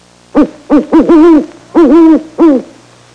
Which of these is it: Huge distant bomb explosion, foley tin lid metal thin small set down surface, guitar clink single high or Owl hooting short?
Owl hooting short